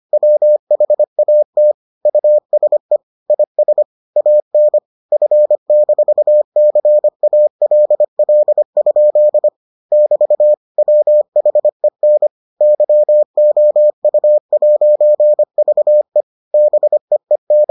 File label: morse